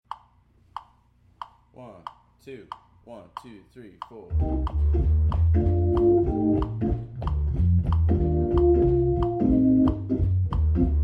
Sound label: Playalong Track, Key of Bb; Litchfield Jazz Camp